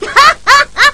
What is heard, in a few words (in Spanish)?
risa